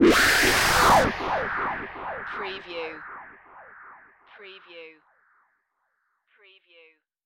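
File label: Production Element Distortion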